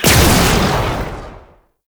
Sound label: Sniper Shot; Weapons; Warframe; Sentient; Caliban Prime Sniper